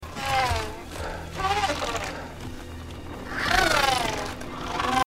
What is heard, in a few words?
Spore Mantis; Spore Mantis Scene Kong Skull Island 2017 Movie